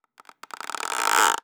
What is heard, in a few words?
Clean Accelerating Creak